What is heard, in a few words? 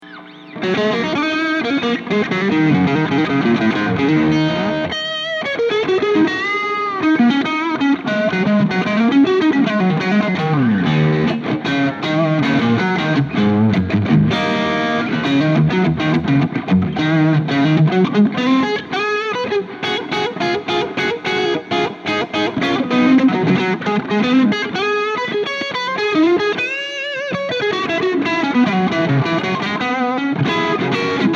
pulsonic dirty